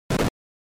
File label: NES Fighting Fall